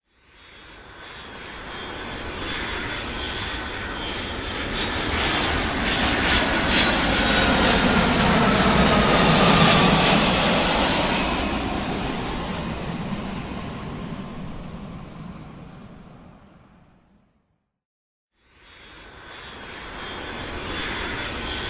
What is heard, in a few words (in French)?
Avion